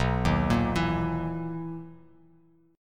B Major 11th B down; BM11; arpeggiated; JGuitar

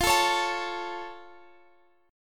C sharp Augmented, F

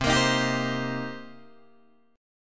C Minor 7th C Guitar Open D

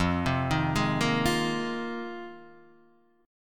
F Minor 11th, Guitar DADGAD

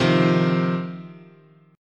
C Minor Double Flat 5th, guitar chord

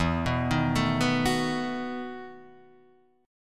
B 7th E; B7/E arpeggiated; JGuitar

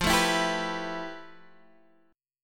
F Major Sharp 11th; FM#11; x,8,7,9,8,7; strummed

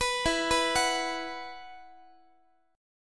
E Suspended 2nd, Guitar; Esus2 arpeggiated

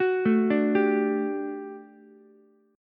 G Major 7th Suspended 2nd G down Ukulele; GM7sus2 arpeggiated

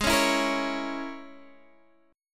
C sharp Minor 6th G sharp

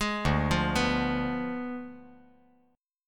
D Diminished 7th D down

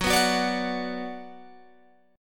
F sharp Suspended 4th Sharp 5th, Guitar, Open G; 16,16,16,19,19,16; down

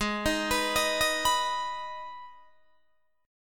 Ab Minor Ab, Guitar DADGAD; arpeggiated; 18,18,21,20,18,21; down

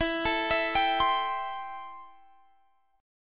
A-Minor 7th E

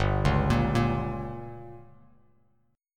G Diminished, Bass Standard; arpeggiated; JGuitar

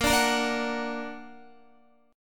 Bb Augmented Bb, Guitar; strummed; JGuitar

